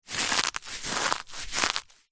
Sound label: leisure hacky sack footbag crush squeeze crunch